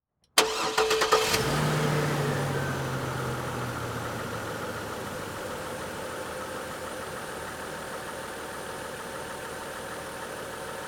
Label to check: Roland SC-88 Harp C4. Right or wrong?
Wrong